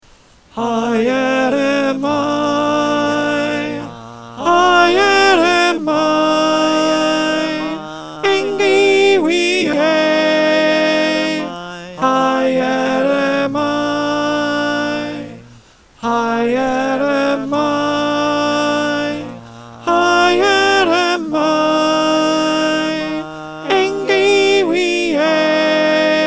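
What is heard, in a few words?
Alto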